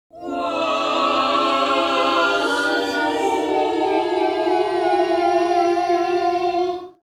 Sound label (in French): oiseaux